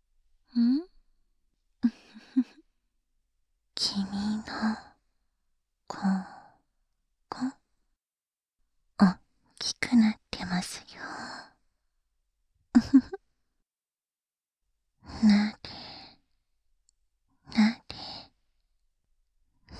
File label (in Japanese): お姉さんの優甘耳舐め 右耳 SE無し; 手コキ